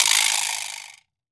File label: vibra slap; Cuba